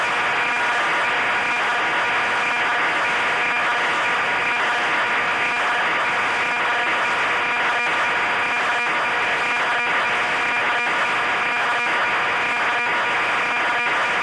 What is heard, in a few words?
RS92SGP Audio